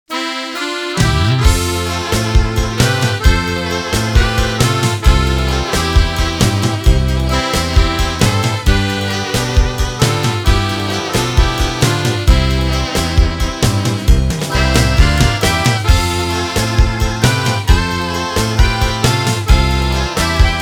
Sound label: Tango Beguine